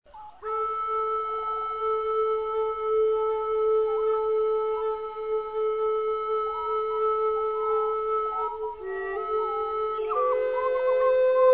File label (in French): musique therapeutique